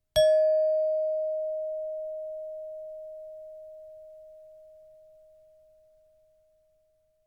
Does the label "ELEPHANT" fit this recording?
No